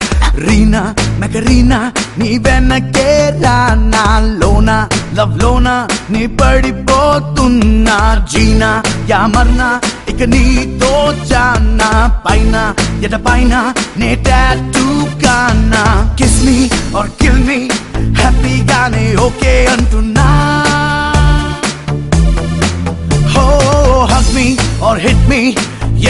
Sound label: Tamil South Indian; Abbayitho Ammayi; Meena